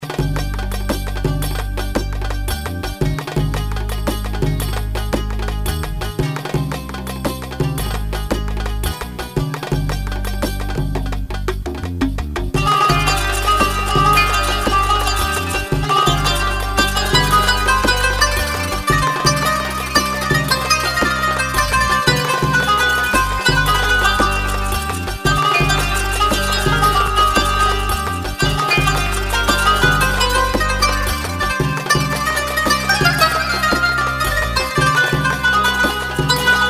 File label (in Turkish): 9/8 sanat, GW-8